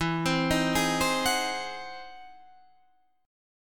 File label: E Augmented Major 9th, Guitar, Half Step Down